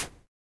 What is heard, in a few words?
battle text